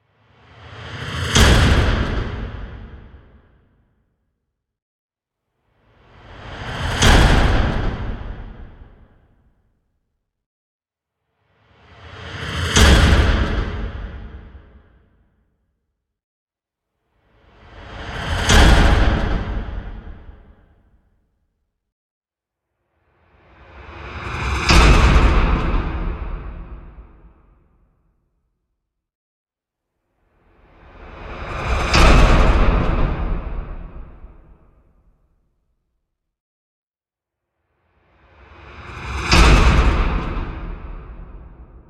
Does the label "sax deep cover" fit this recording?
No